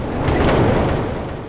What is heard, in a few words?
jump